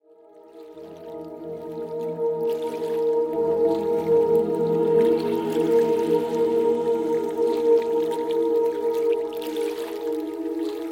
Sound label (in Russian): zvuki prirody dlya malyshei; ideal naya kolybel